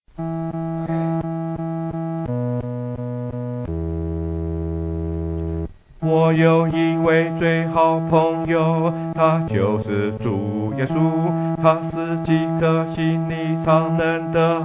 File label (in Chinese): 独唱 第四声; 谷中百合花